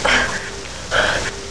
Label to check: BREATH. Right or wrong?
Right